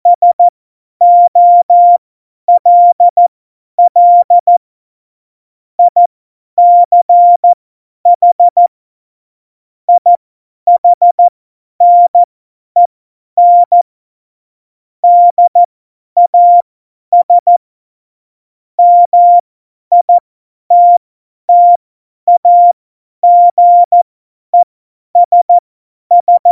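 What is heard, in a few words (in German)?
Witz, cw morsen; Morsekurs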